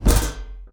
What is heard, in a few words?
metal bash; SupaLidlGame